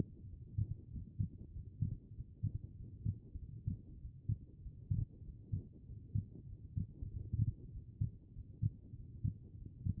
HEART B MODE

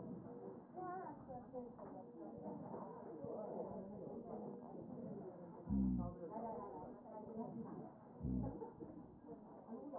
chest

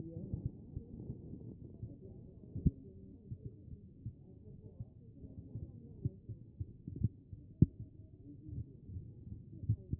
A CHEST; B MODE

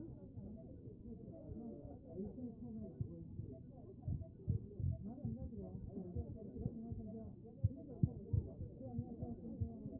chest; B MODE

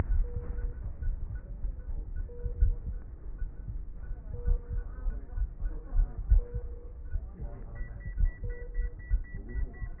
CHEST pos4 W MODE

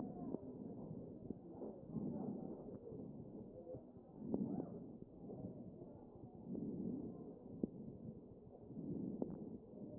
A CHEST

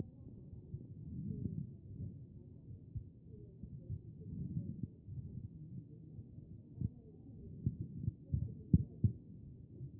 CHEST; B MODE

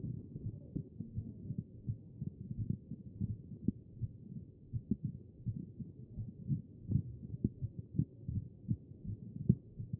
chest; B MODE

